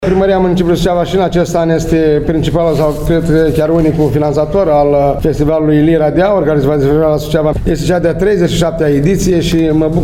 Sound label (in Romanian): lira filarmonica